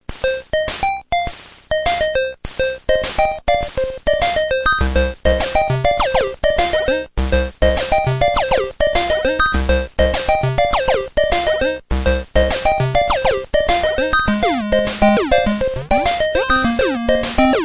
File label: flip background